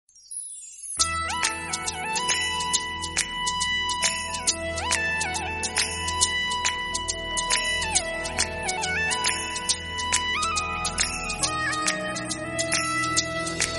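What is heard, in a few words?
background music Ringtone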